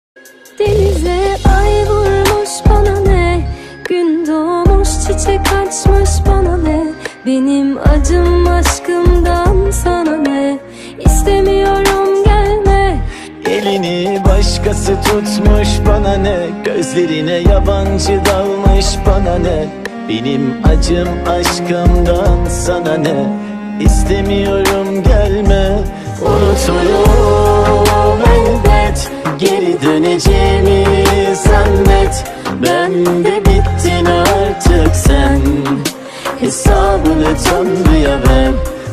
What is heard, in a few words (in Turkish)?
telefon zil sesleri